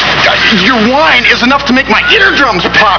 whine

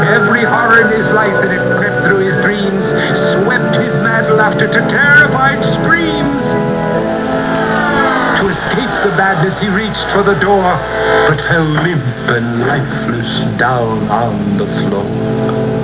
horror; Vincent Price

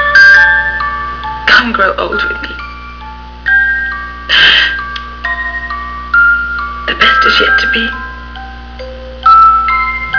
music box

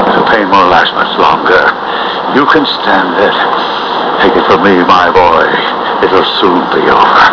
pain

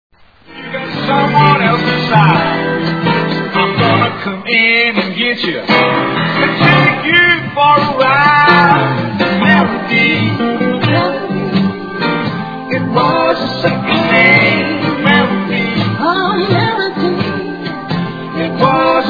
melody

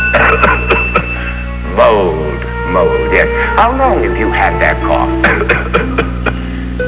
cough

